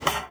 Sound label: GUITAR FX; Xsample VOL-2